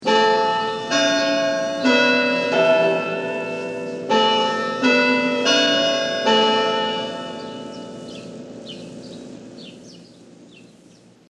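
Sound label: Tower Bell Three Quarter Hour; Bell Sound Effects; Bells (Sound Effects:Musical)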